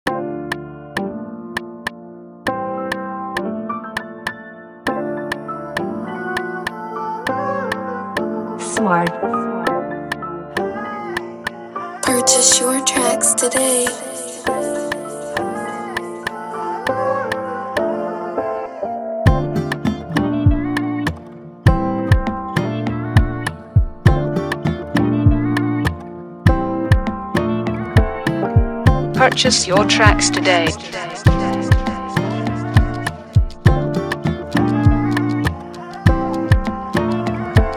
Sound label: TYPE BEAT, afrobeat instrumental